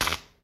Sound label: Spark; Puddle